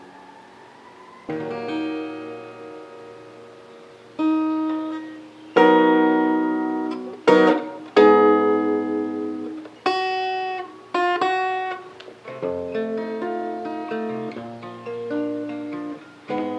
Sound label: instrumental